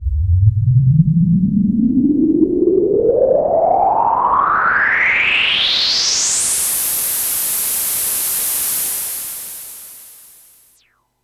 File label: Super Bass Station, Filtered Noise; noise hiss crackle